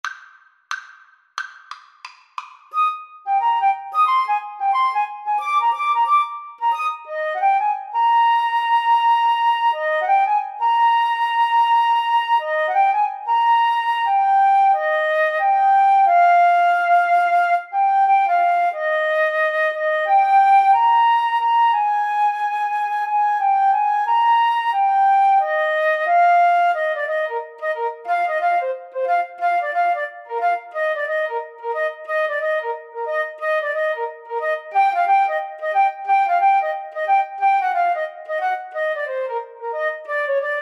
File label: when the saints Flute Clarinet duet